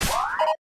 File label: Raygun Equip; criminality